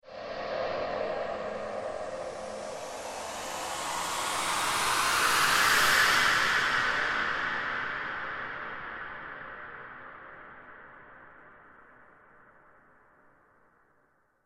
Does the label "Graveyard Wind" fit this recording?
Yes